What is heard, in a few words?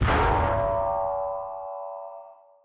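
Rivet Hit Exterior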